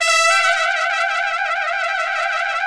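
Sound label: trumpet shake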